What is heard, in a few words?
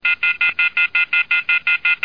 dididi